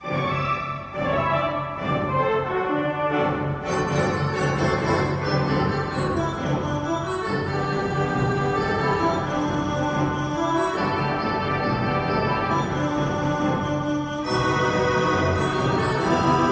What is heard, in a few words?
wurlitzer; chaplin; film